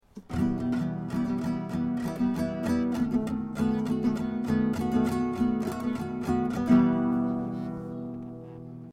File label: mariachi minor seventh; Cowboy Guitar; Minor to Seventh; Mexican "Corrido"